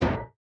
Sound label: metal chest inventory pickup; factorio 2.0.55